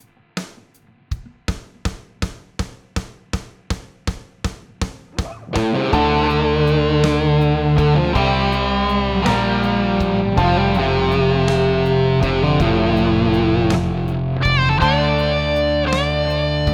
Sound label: Solo Helix